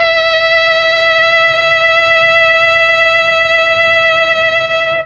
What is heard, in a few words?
E4 viola, mellotron